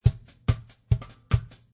Ska Drum; Lounge & Reggae; Loopology; Ska Till Ya Drah

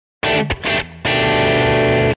Funky Turnaround, Rockabilly

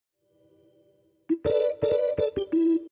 Swish Organ; Funk & Rock; Loopology; Get Dirty

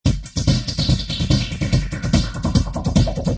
Pump Beat; Loopology; Pumpin; Techno & Dance

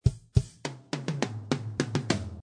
Smack Funk Drm; Funk & Rock; Loopology; Get Dirty

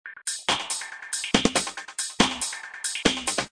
Nice Break, Techno & Dance; Loopology; Crying & Dancing

